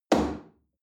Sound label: chair wood place hard